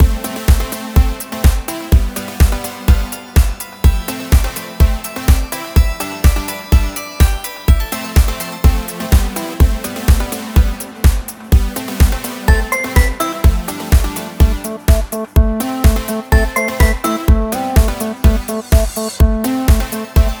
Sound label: A fun little tune